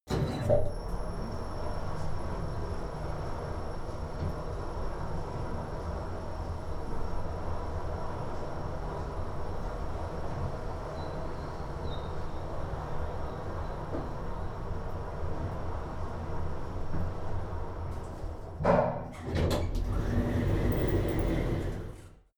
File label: elevator ride buzz high